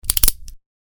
Kurok vzvodyat

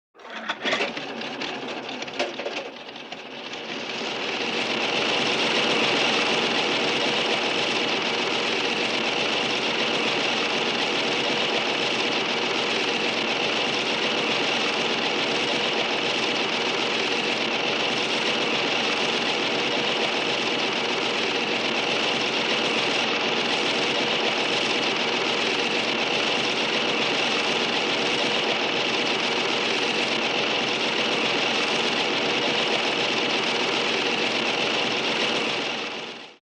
Generator

